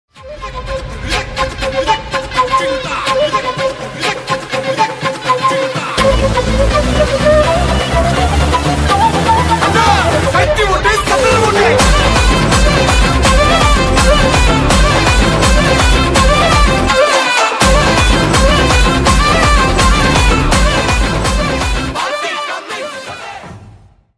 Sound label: bgm ringtone; master